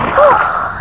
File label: gasp